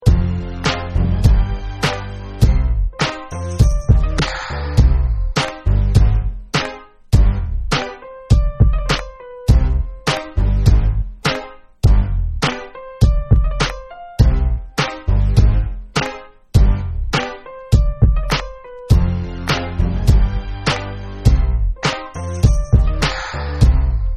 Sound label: AND Instrumental